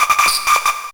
MORSE160, Hard & Loud Techno; USB Soundscan vol.01; AKAI

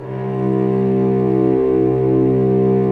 STR, Vcs mf Amb

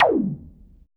CYBER, Drums; Drums In Your FACE!; CYBER PRC

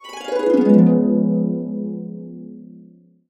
Db, Harps; USB Soundscan vol.62; Complete Harps; AKAI

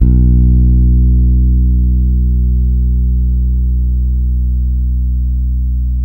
Bass, FINGERED; Spectrasonics; Bass Legends

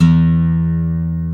GUI AC., Ac. Guitar; Sound & Vision; Gigapack I CD 2; GUI ACOUSTIC 1

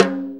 Snare, Snare Drum; Drum Tools

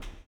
STOMPS; Spectrasonics; Vocal Planet; Blues Soul

